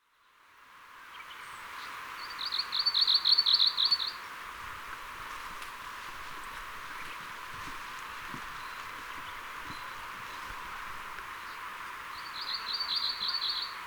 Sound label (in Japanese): mebosomusikui, bird song; メボソムシクイ; さえずり①; 長野県小諸市 高峰高原; J-eco 鳥のさえずり図鑑